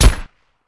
Gun Shot Silencer, silenced singles

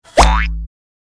Suction Boink, boings

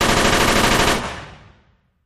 Machine Gun Sngl Rap, single burst

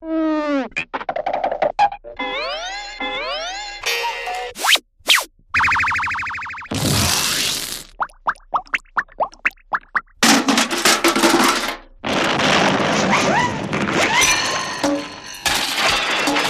Crazy Collage, Party noisemakers, assorted noises

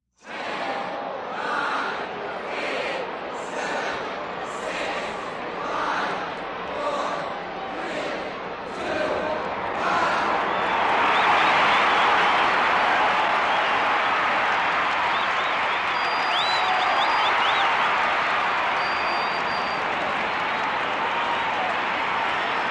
Stadium Crowd Countd, large

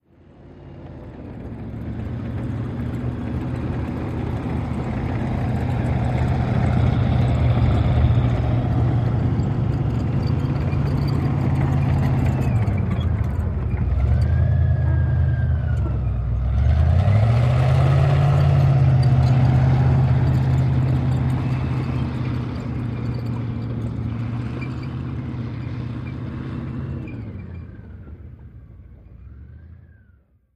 APC Stop Idle Away, armored personnel carrier

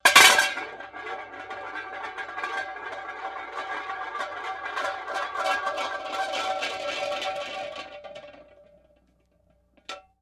Hubcap Manhole Cov, crashes

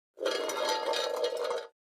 Coffee Can Rolling On; barrels buckets & cans

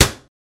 Cabinet Door Slam Cl

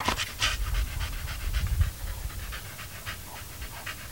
Dog Panting Loop

